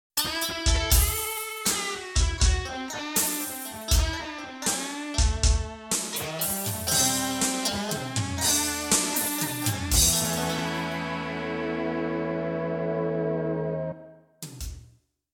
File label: guitar